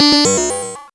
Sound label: MOOG HAT; AMG - Vince Clarke Lucky Bastard